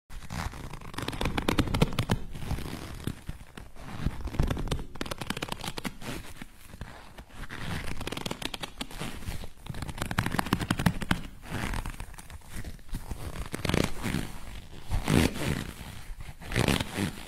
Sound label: leather fabric; پارچه چرمی